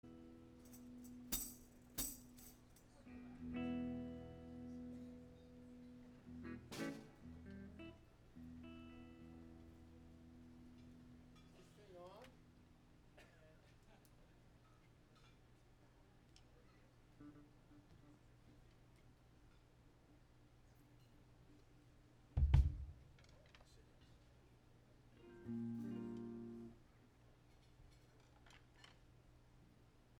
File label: tuning